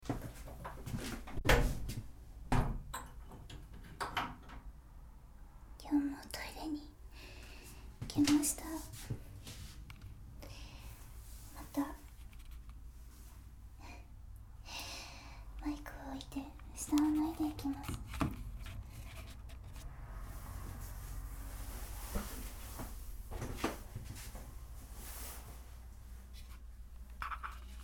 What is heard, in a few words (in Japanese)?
おしっこ, 寝起きおしっこ